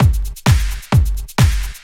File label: techno, DRUMLOOPS